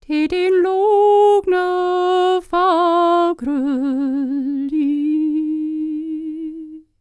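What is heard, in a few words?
Scandinavian, World Voices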